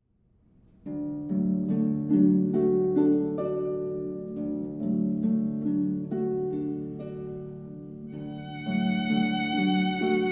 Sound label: violin and harp duet